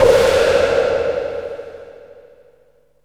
BANGS; AMG Megabass REMIX! VOL-2

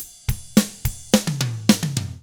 POP ROCK, Double Platinum Drums; 109POP ROCK

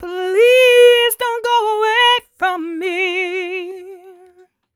f 126 mel f-sharp, fem Vocals

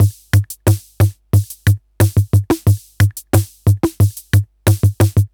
retro drum machine Loops 90bpm; Copicat; MT40

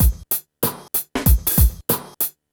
PHATT BEA, Hip Hop; E-MU Formula 4000 Series Vol. 1; Hip Hop Nation; PhattBeats95-99

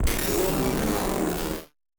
Battered Synth; malfunctioning synth samples

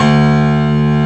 BECHSTEIN A1; East West - Global Sounds

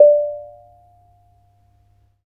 BONANG D5P; Spectrasonics; Heart of Asia

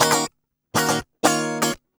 GUI 120 5aD#; Best Service Gigapack 2

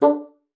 D4, BASSOON; Xsample VOL-6

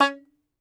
Tenor Banjo, Plucked Strings

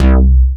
PUNCHY BASS; Masterbits; Dance Trax

